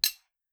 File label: metal small; Phasmophobia